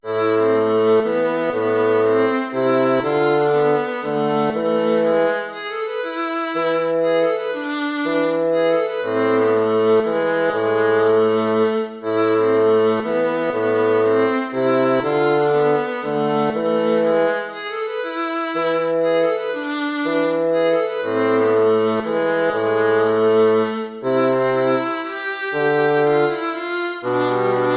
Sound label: baroque minuet minor